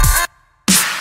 electric instrumental

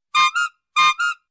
very high double clown horn honks

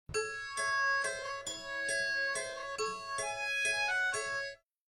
transition; maid dragon

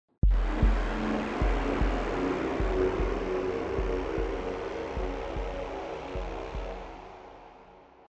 impostor win

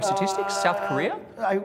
uuugh

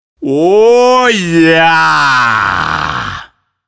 laugh

